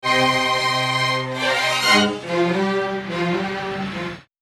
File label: intro reversed